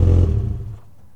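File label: Truck Cherokee Off; SFX Kit; Trucks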